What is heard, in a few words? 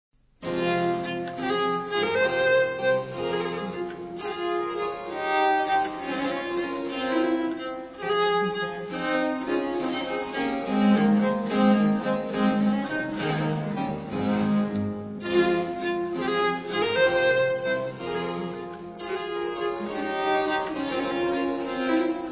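Gigue, Pièces de viole; Jordi Savall - Ton Koopman - Hopkinson Smith; Marin Marais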